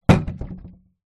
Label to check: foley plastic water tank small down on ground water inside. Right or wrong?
Right